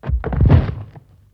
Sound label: Body Shuffle